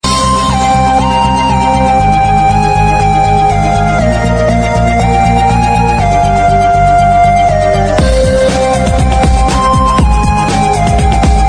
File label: lovely tune musical mobile ringtone